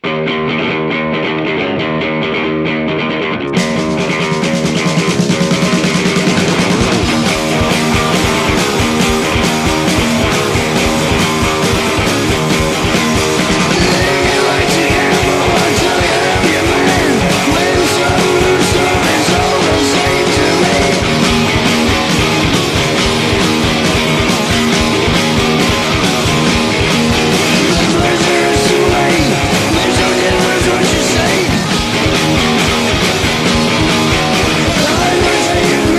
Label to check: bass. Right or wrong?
Right